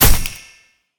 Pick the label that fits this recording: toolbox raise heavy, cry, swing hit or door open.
swing hit